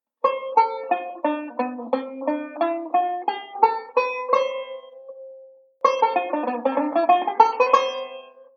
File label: Modal Sequence; Beyond Chord Melody Volume II